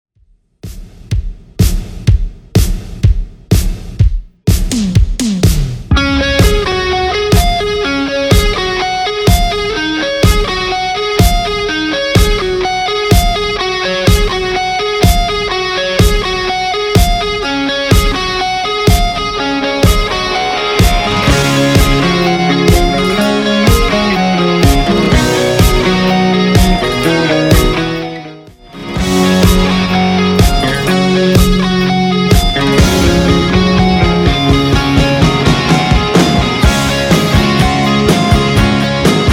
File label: Rock DJ Friendly 125bpm CLEAN